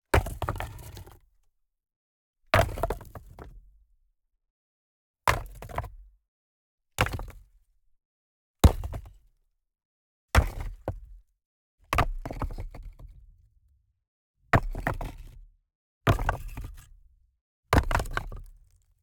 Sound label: Collapsed Stone House - Rock Single Large Impacts - 1 Meter. Rock, Large, Impact, Single, Land; Mattia Cellotto; Rocks Momentum